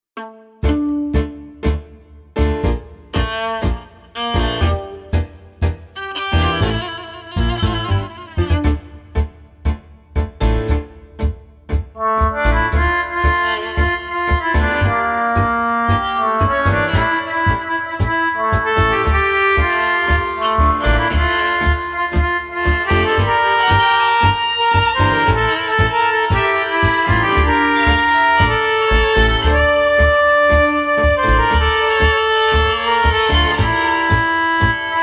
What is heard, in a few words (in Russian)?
sentimentalnoe tango oboe